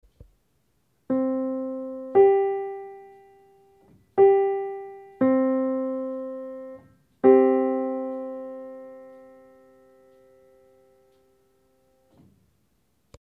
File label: 698 Vallot Young